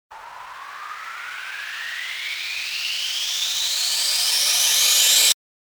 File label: RISER